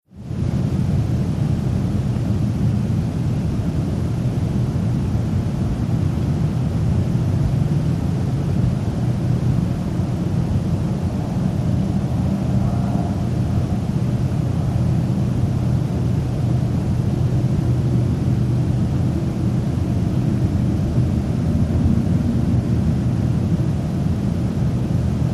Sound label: Dist City Rumble BG, big city